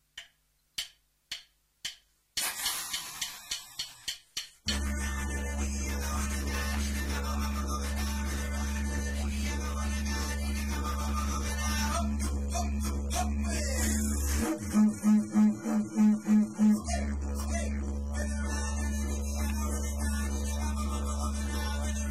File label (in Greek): Corroboree Μουσική Αβοριγίνων με ντιντζεριντού